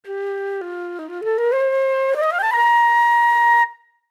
Flute Scale, Flute Scale Up Slow Melody; Musical Flute G Scale Melody; Woodwinds; Blastwave

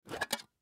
Candy Tin Open; Foley; Clicks; Objects; Blastwave

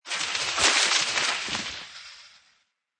Skiing Snowboarding Slide Fall Distant Snow Slide; Skiing And Snowboarding Sound Effects

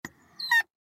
Squeak Metal Lever, Squeak Metal Lever Short; Squeaks; Foley; Sound Effects:Objects; SoundEffects-Blastwave

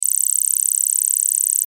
DIGITAL STREAM; Multimedia; Sound Design; Blastwave

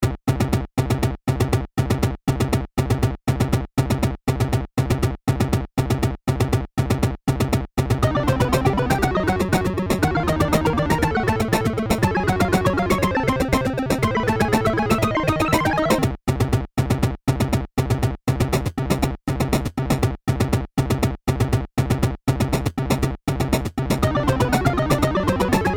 Video Game Boss

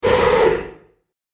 Horror Beast One Shot, monster; Monster Sound Effects

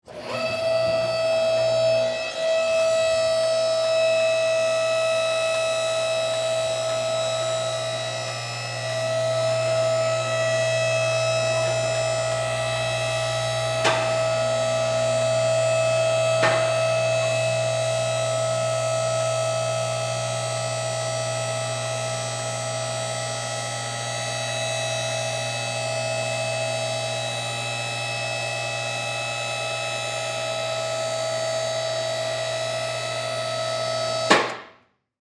Industry, Auto, Lift, Operate; Machinery; Machines; BlastWave

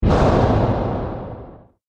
Epic Impact; Miscellaneous Impact Sound Effects

